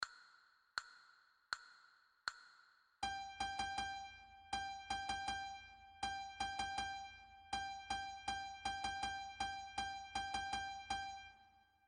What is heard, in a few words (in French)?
Sol, flute a bec